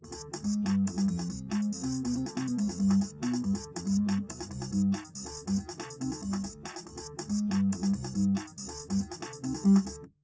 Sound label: wdrums; SiSEC10; underdetermined